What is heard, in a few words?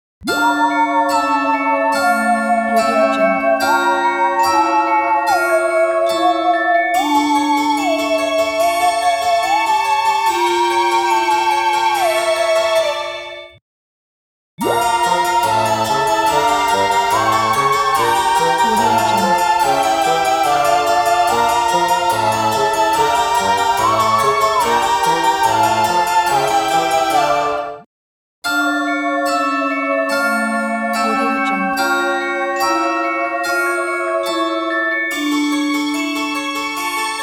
halloween haunted house